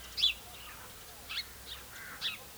House Sparrow; woods; Ariel Foundation Park

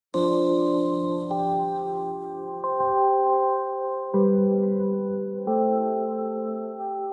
jingle

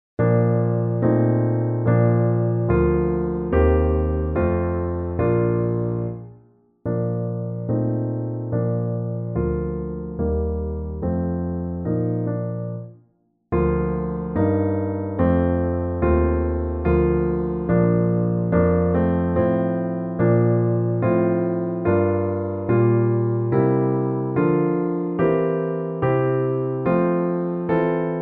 Piano Sample; Bb; Bach; Wachet auf

